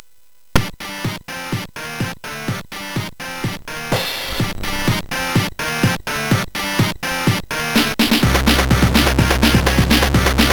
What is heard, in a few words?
Pravda, sid MOS6581R4